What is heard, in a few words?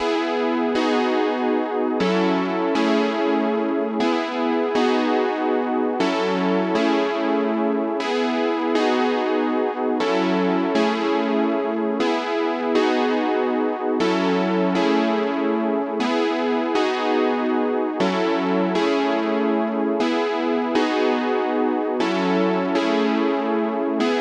120 BPM C# Min Pad, 1980s; Melodies; Loop Stems; Arcade